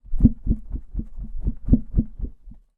household container tub rubber large shake wobble empty